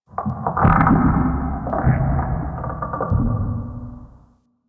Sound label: Tentacle Creak F; Ambience; Interior; Warframe; Orokin